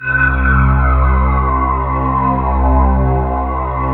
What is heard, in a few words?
SYN MORP, Roland; Sound & Vision - Gigapack II; Morpheus